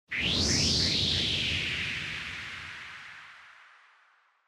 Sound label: Motion Speed Lines Low Short; モーション スピード線; 低 短